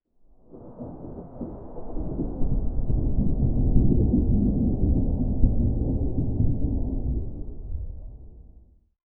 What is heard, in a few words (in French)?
tonnerre au loin slow, analogue distortion